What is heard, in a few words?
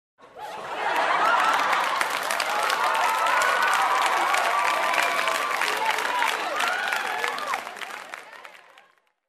Audience Laughs and Cheers